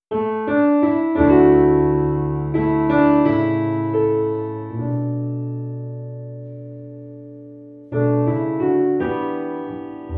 Piano Peace

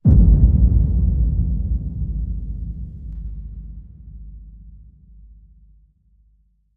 Hit Low

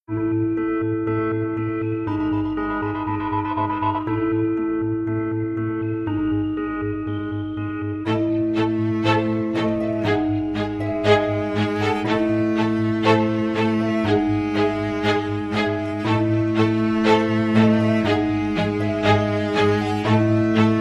Strings No Drums

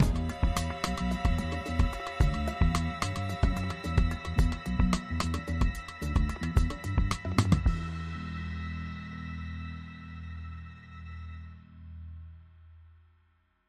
Funky Tension Long Tail

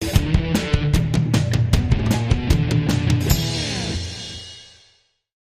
Dynamo Rocker, Sting

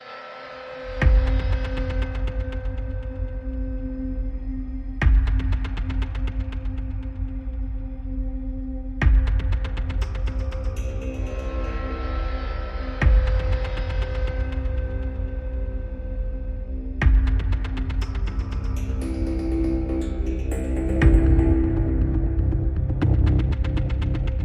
no strings

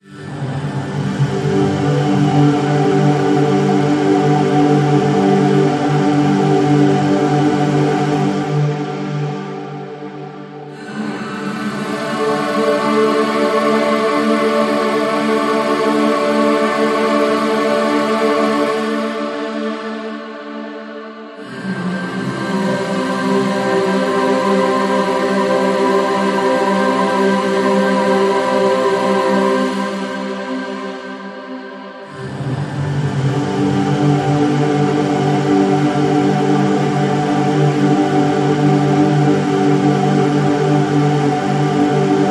Curious Ethereal Choir

